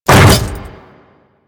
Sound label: Hammer Boss Step Default, Footsteps